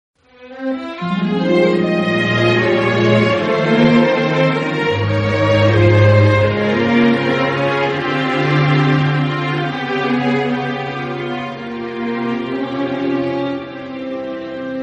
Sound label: sad instrument